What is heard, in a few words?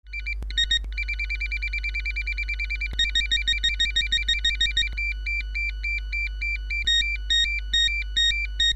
sound base; voxtel 2500